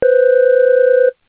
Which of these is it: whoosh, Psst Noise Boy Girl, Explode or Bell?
Bell